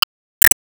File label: Enemy Train Light On